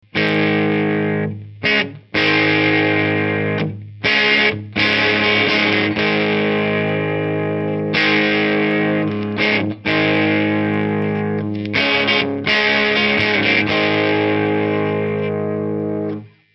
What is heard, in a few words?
crunch Telecaster; guitare; ampli 12AT7 + ECL82 SE